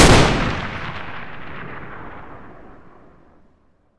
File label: m82 fire, sniper; weapons; garrysmod